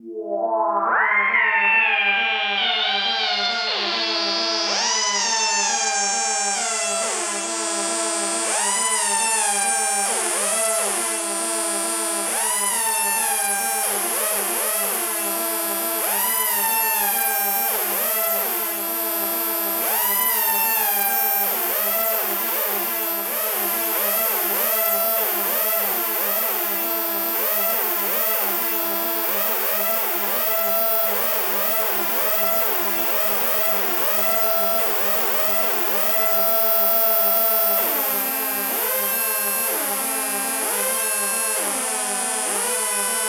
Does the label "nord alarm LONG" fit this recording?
Yes